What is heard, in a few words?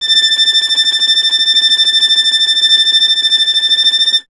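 string violin, Vln Trem; Miroslav Vitous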